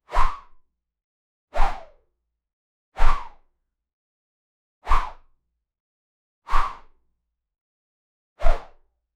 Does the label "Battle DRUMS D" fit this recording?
No